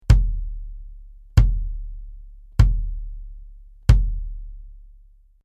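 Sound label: BASS Ctd Hard Plastic, bass drum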